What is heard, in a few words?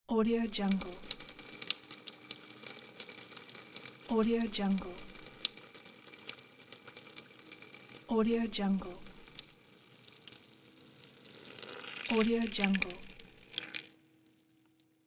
urinating